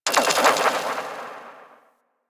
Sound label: Spring Bounce, Ambience; Warframe; Corpus Railjack